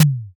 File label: taiko